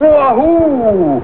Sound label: Wahoo